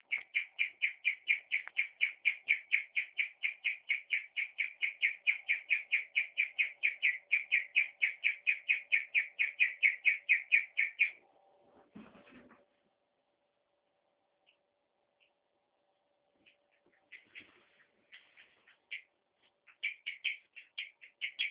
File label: chirp long, guinea pig